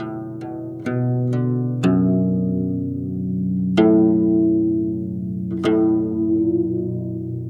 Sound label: Traditional Guzheng; Asia; Continental Drift Aftershocks